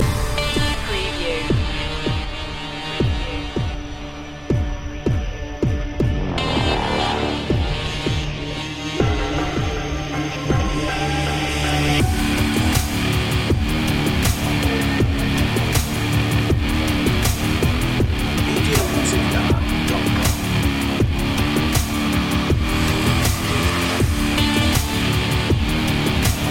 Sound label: cyborg dance short loop